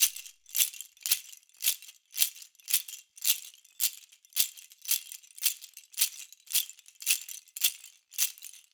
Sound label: rattles loop, 110